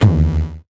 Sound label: VocoBit Noise, 8-bit; musicradar; 8-bit bonanza